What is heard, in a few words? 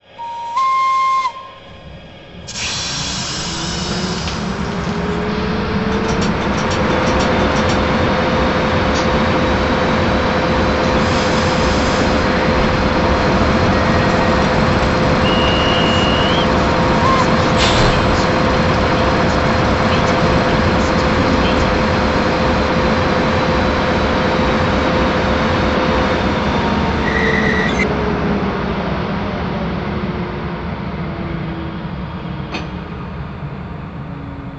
Märklin Sound